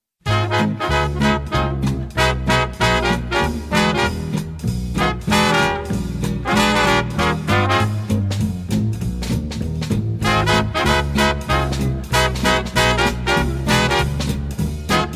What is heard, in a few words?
Swing Ringetone; telefon